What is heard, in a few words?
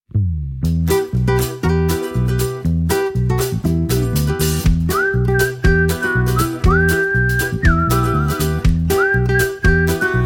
whistling music; dolphin tunes